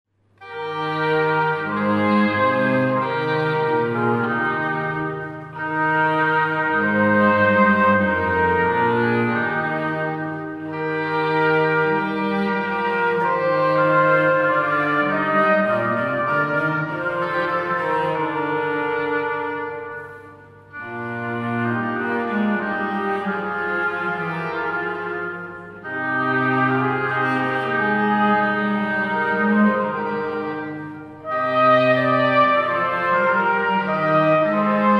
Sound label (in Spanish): Trío instrumental trompeta oboe violonchelo; O Santissima